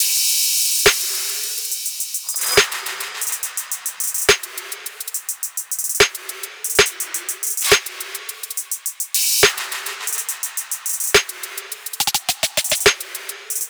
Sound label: 140 Drum Top